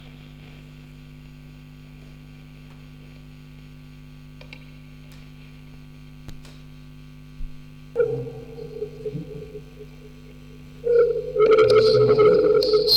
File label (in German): Aufnahme Spieluhr Vocoder; Rode NT4; Tape out; 10 Kanal stereo; Spieluhren-Quartett mit Tonband; Spieluhr Set-Up 2